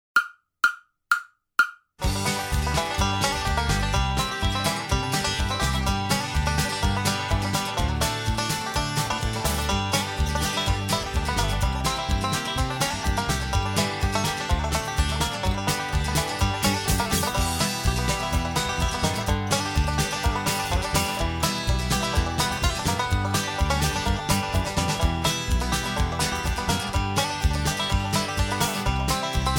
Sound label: 126 BPM 16 bar Bluegrass